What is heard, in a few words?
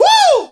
Vocal Oneshots; Vengeance Samples; Vocals